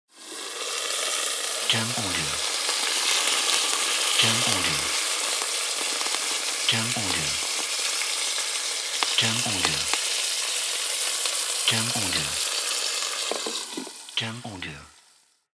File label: Frying Open Lid